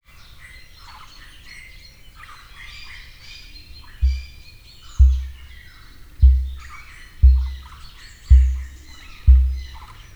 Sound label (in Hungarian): egyszer, emu; miskolci zoo 2009